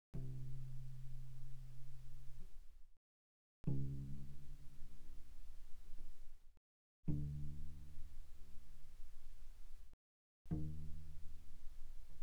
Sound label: Cello pizz pp sul C C2 B2; Strings